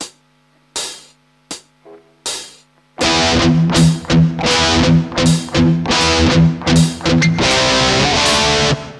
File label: mute, muting and palm muting